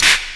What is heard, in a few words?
CLAP, Dance Drums